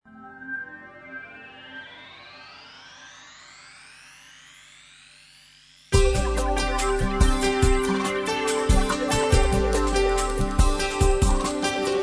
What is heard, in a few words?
Acoustic Jungle; Suite Collection Vol.3